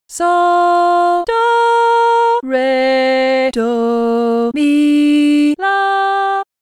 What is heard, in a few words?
Easy Melody Solfa; Easy Melody 2